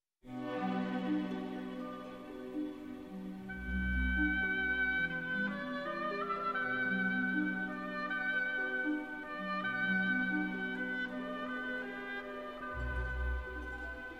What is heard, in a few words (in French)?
hautbois; Tchaikovsky